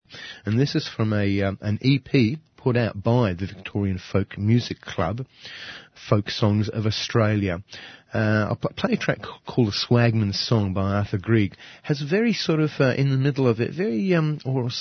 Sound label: folk songs of australia